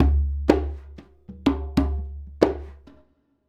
Djembe; Drums; Sonic Foundry; Essential Percussion

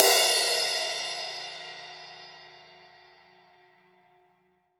Drums Acoustic, RAW KIT; USB Soundscan; AKAI

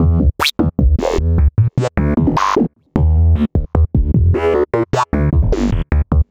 Synth Bass

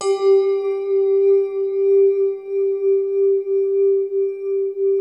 GLAS G2, ATMOSPHERICS; Music Store - Claudius Diemeter Schwerlos

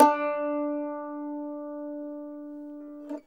BJN D2; Sonic Reality; American Heartland

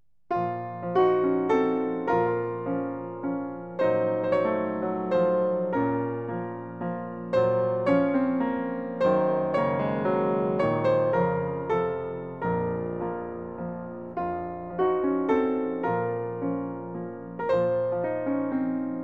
Armenian song; Piano; Fourth Way

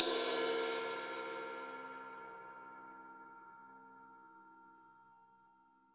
RAW RIDE, Drums Acoustic; ALL RIDE; USB Soundscan vol.10; AKAI; 90s sample CD

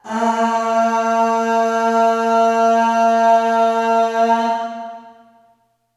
Aah Long A#2 Short Reverb; Sonic Foundry; Harmonic Building Blocks

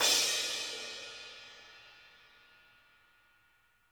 crash; Sonic Reality; Interactive Drum Kits; 90s sample cds

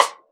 RAW KIT RIM, Drums Acoustic; AKAI; 90s sample CD; USB Soundscan vol.10

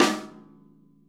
Interactive Drum Kits; Sonic Reality

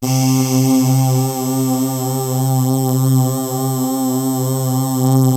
PPG CULT C2; Music Store - Claudius Diemeter Schwerlos; DIG MYSTICS

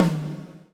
GATE TOM, Drums Acoustic; USB Soundscan vol.10; AKAI; 90s sample CD

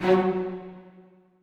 orch; Masterbits; Switch FX

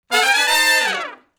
Fast Climb Up (F) har, Horncraft for R&B; Crimson Blue and Fabulous Horncraft 4 RnB; Sections

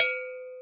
Gankokwe Lo Op; Multisamples; Digital Sound Factory Planet Earth

